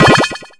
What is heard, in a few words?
hit poison; Combat; combat impacts